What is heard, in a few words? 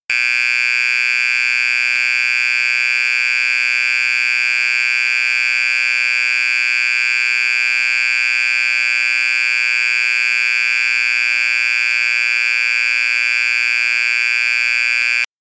Horn